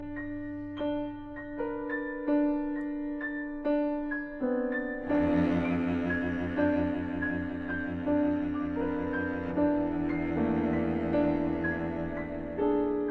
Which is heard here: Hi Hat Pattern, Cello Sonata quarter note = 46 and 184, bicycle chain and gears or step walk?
Cello Sonata quarter note = 46 and 184